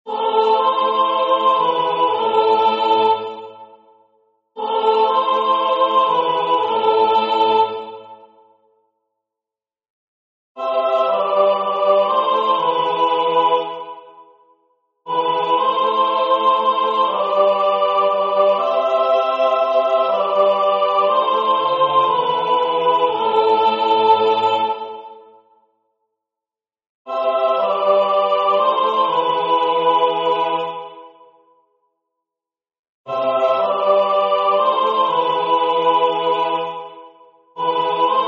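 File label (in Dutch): Alleluja soprano, koor; J.S.Bach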